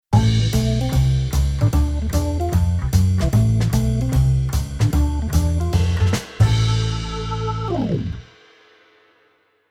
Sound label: keyboard organ jazz